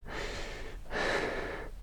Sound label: Player UI, sfx; Five Nights At Akers; soma